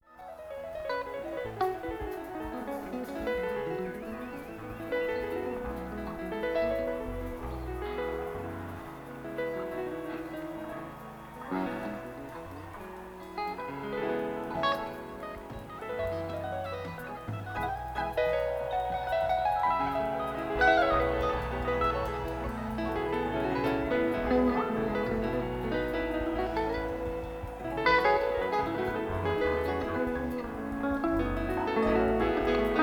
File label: Tuning